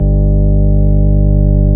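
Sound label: CX3 overdrive G1, ORGAN; Sonic Reality; Vintage Timetraveler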